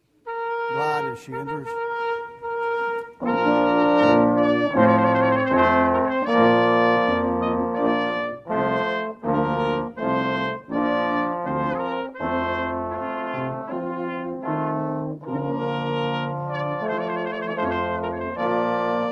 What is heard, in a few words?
Trumpet Voluntary